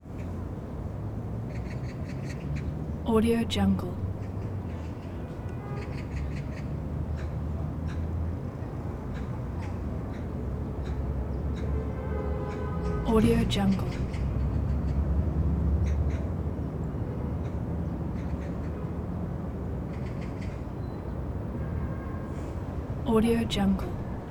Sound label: birds yuan dynasty dadu city wall park; prosoundfx